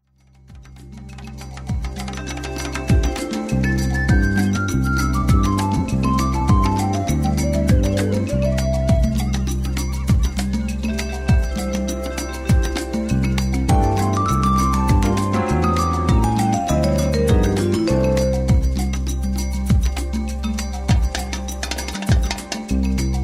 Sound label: Happy latin